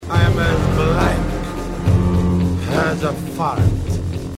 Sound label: blank fart